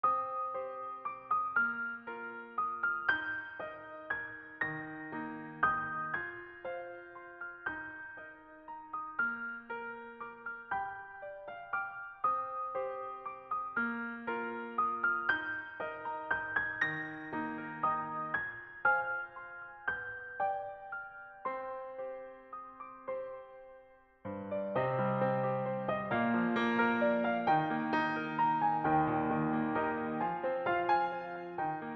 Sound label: Piano Refrain